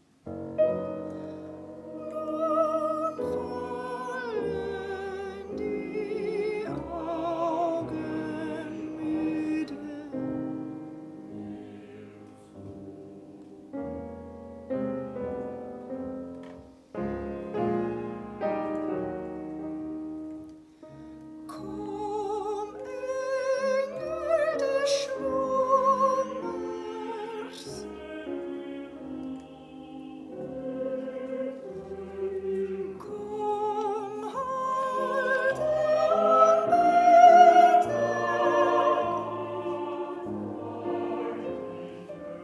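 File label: soprano; Zur Nacht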